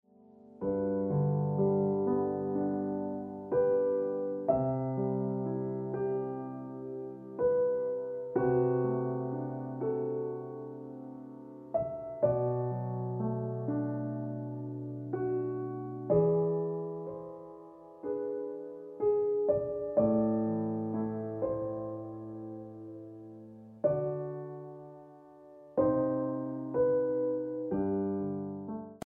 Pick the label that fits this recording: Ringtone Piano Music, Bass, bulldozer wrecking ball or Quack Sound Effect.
Ringtone Piano Music